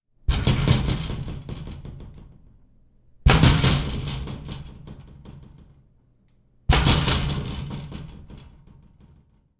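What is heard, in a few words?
rattling of a glass door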